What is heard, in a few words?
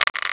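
rope creak; Thief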